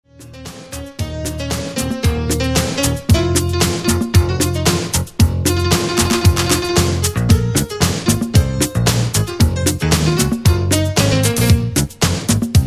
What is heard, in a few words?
Piano